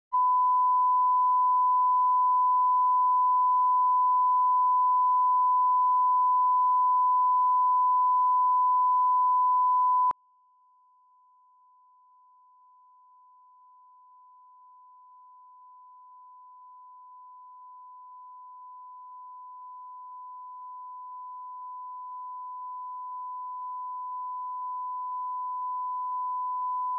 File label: 1001hz, -20db, -60~0db L+R [linearity, Distortion]; Denon Audio Technical CD